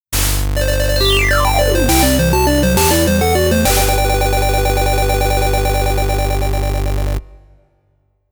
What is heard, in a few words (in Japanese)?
リザルト画面 -勝利- 8bit ver